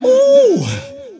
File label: "ooh", voice